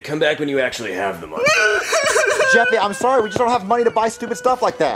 crying

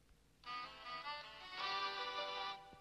duh duh duuuh

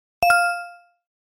correct

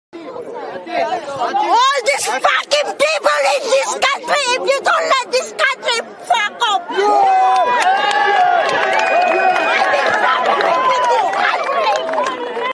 woman yell

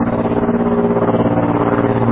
republic gunship engine; lego star wars

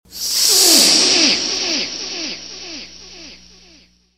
coke snort echo

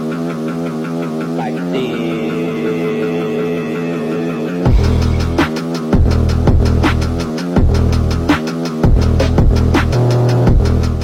phonk

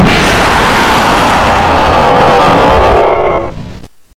jumpscare; fnabear beautiful location

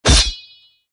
sword slash

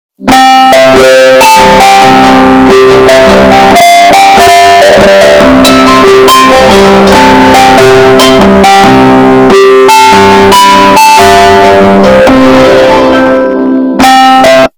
android alarm but bass

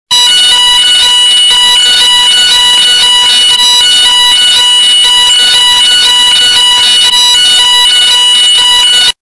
very loud fire alarm; gent squad

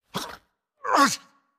grunt; thor

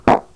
hurried fart

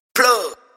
loud plug

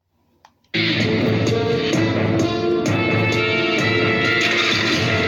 tension music

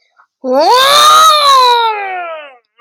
rrrooaaaaaorrooraoorooarrrrr

